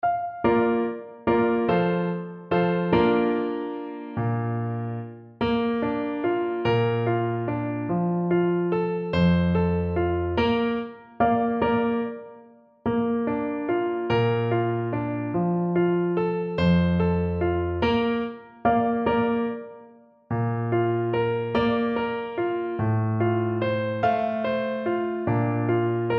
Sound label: Clarinet